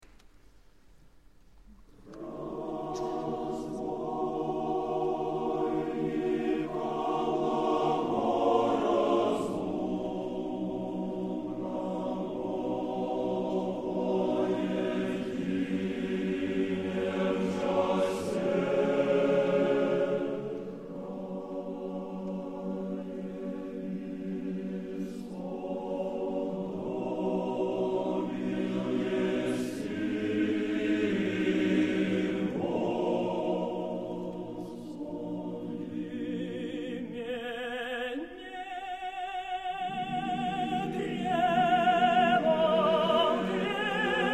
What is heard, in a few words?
male choir